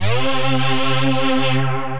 Fall, synth pad; Ableton; Glitch and Wash

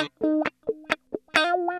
133 Dance El-Guitar Wah F; Dance DJ

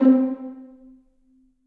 Strings Ensemble Pizzicato, Orchestral Strings; Ableton

